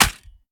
reload mag in; gmod

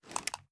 reload empty xxmag rattle; gmod; iw8; uzulu

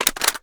reload empty fast mag out, reloads; gmod

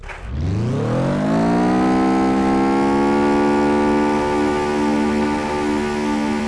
vehicle reverse; blista

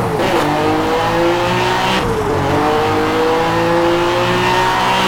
second, vehicles

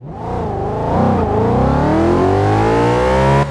first, vehicles; gmod; tdmcars; rs4

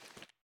ads down, reloads; gmod

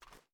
inspect; gmod

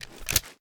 reload start twist, sh; iw8; romeo870; gmod

